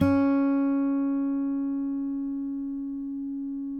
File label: COLLINGS C#4, Guitars; Spectrasonics; Hans Zimmer Guitars VOL-2; FNGSTYLE PFM